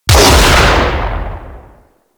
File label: Heavy Shotgun Shot B; Warframe; Tenno